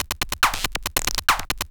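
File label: DRUMLOOP ELECTRO 140; Techno2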